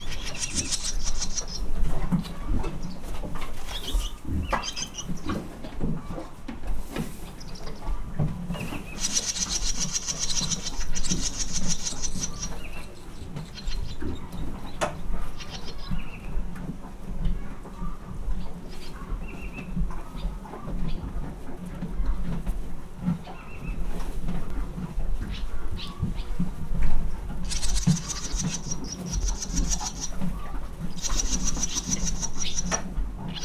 istállóban ló eszik; parasztudvar

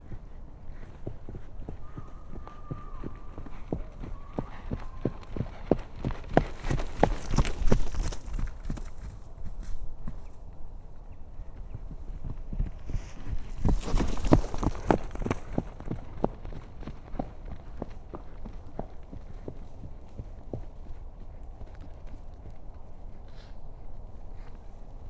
oda vissza poroszkalo lovas, lo; állathangok; paraszt udvar

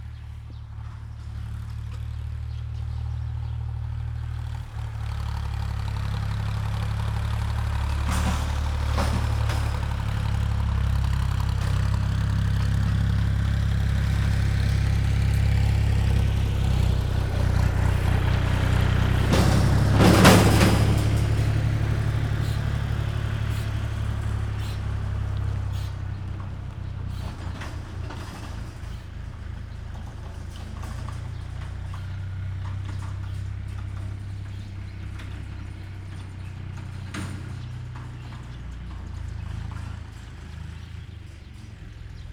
traktor pottal, vasuti atjaro; perkupa

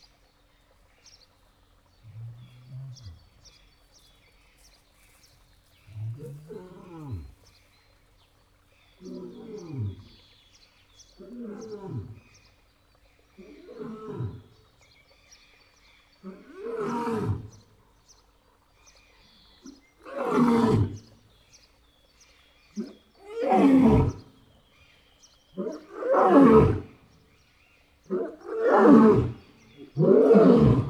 jobbról besétáló hím, reggeli bömbölések, oroszlán; jaszberenyi zoo 2016

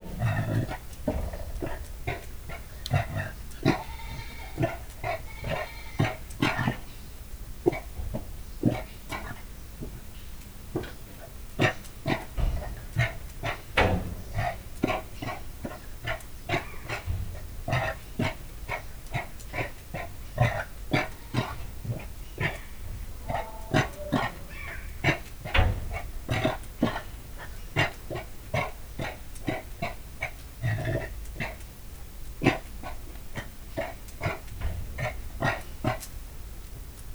sivatagi hiuz; miskolci zoo; kilenc százalékos szűréssel

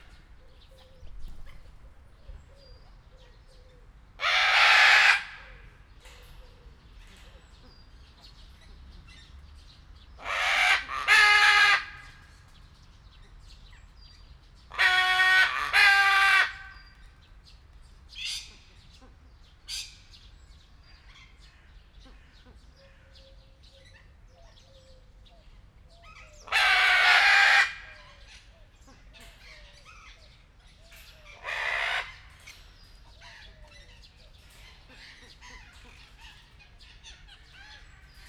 nem világsztár, zöld szárnyú ara; debreceni zoo 2019

